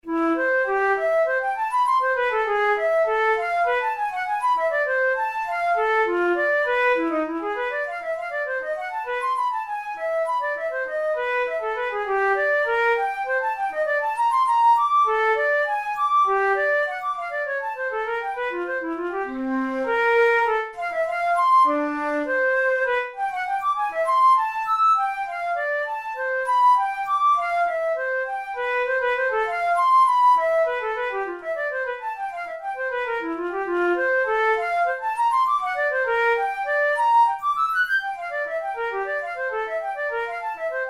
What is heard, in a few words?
studies for flute solo; karg-elert